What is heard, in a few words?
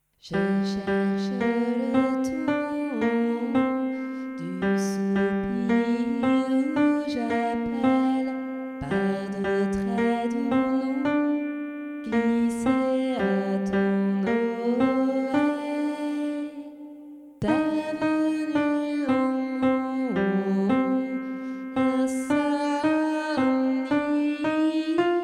alto